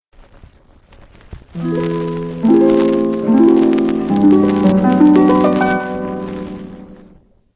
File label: original harp; 1960 Original Harps